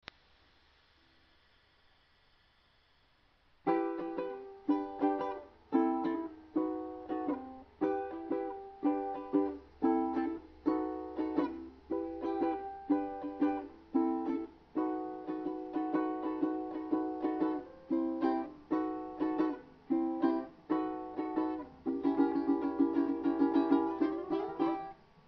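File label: ukulele blues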